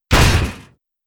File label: ice lord, monster